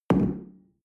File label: shell place on tablecloth hard; berufe; gastronomie; soundpool